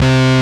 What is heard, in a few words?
Single Acoustic Basses